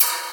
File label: SPRINGS, Percussion; InVision Interactive; Percussion Slam